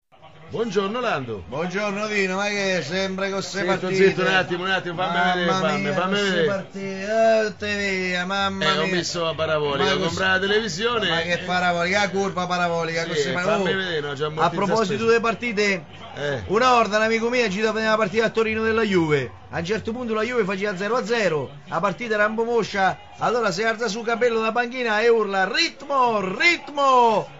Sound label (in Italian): Ritmo